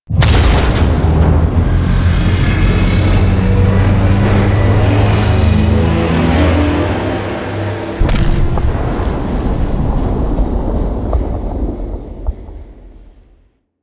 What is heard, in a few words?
Corpus Gas City Reactor Meltdown, Ambience; Warframe; Gameplay Remaster